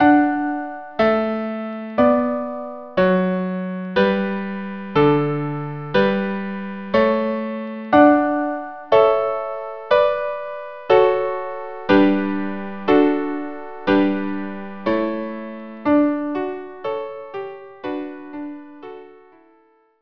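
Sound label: violin viola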